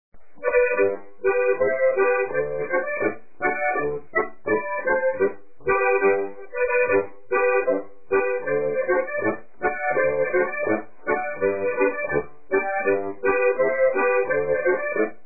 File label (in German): Marschier Polka; allgemeines volkstanzen